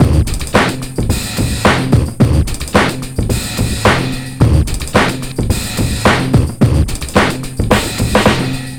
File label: DRM, Breakbeat; Best Service ProSamples vol.24; AKAI